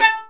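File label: violin, marcato; Violin 1 vb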